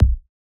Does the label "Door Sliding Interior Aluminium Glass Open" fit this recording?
No